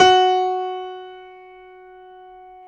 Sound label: PIA GRDPI, PIA_GRAND, Roland